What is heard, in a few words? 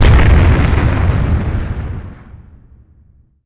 BLAST; ImpGal2; spacewar destructions